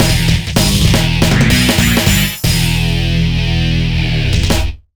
Block Game Over; 1500 ds spirits vol. 03 block kuzushi 2007 nds

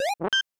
Tile Match Jingle; Shanghai 2 Dragon's Eye; snes

